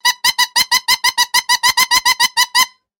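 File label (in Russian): гудки комедийная очередь, клоуна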